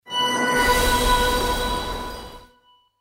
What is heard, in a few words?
queen win; Champions of Poseidon